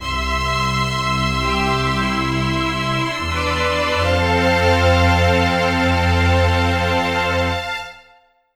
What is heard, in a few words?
Meadow Strings C-F maj; Sunshine